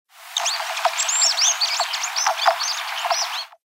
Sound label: rainbow-bearded thornbill